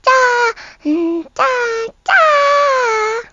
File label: pika hum idle, pets